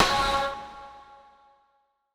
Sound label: Snare, Grime, 138bpm; Zero-G Sound Sense Grime Scene; Construction Kits; Da Suspects